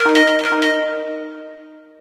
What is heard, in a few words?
lose; falling tim